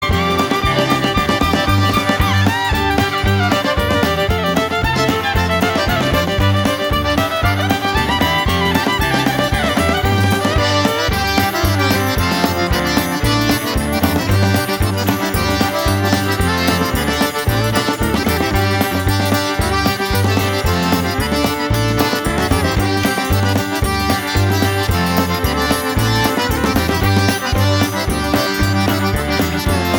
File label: Jig